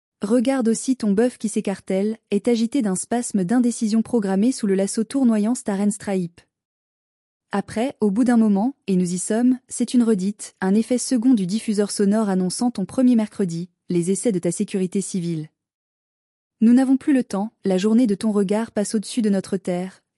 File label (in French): veloute de hautbois; avec bequille